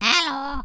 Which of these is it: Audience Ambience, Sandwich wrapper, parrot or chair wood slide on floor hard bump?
parrot